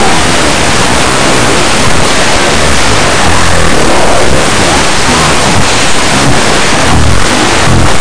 club

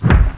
Doom 2 Explosion

